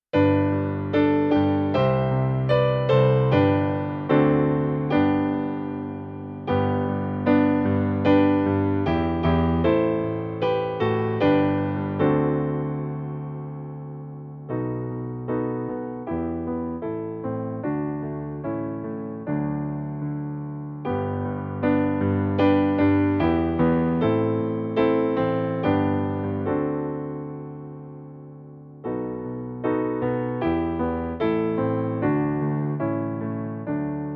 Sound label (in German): Ehre sei Gott in der Höhe Instrumental Playback G Dur